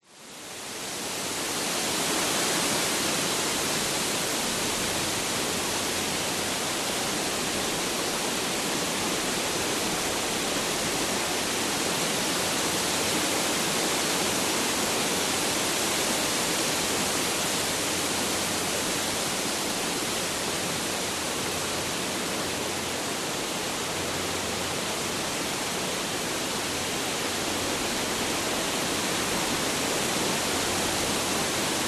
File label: nature wind trees forest